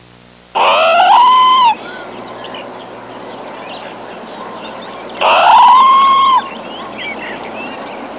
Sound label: Parrot Squawking